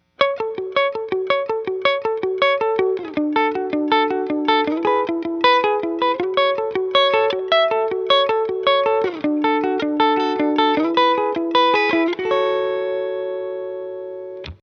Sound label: 5ths Dry